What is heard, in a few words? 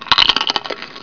crrunch